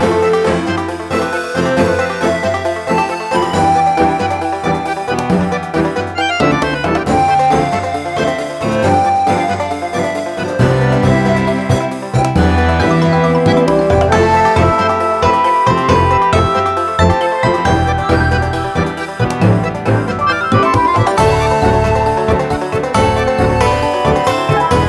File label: credits loop; Sparklite